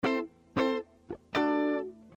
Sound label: Guitar